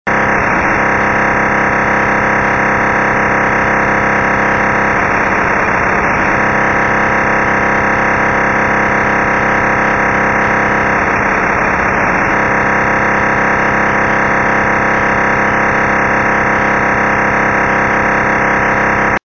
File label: TRBO, DSD decoder